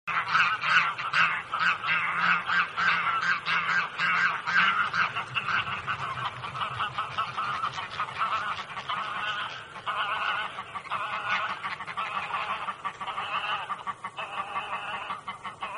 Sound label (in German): gaense